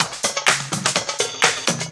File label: drm 125, drumloops; PS-28 Vocal House Samples; stompdaperc